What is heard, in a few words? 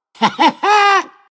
alch laugh; alchemist